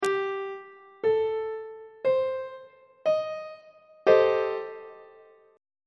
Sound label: a half diminished 7th 3rd inversion on treble clef